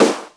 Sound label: downplay, snares real